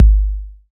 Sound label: BD 909, TR-909; 90 s Sample CDs; Sound & Vision - Gigapack I CD 1 (Roland); TR-808 & 909